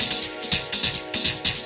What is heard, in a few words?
145 thin hat tone, drum loop; 141 to 160 bpm